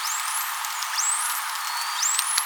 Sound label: Whistle